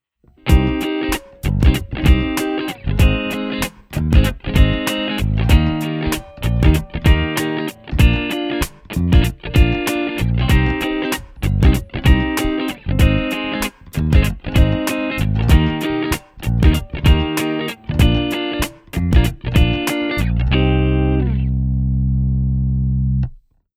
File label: gt clean cable funk 2mix